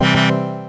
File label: organ; Goldwave